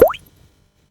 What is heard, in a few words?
pop